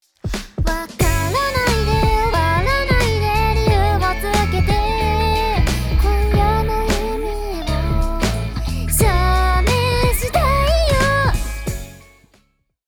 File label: all Effect DAW 2 mix